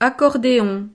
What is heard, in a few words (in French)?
accordeon